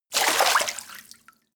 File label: splash